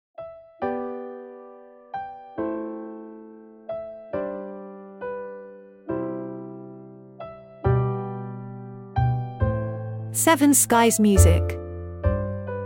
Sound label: Ole Ole commercial without crowd singing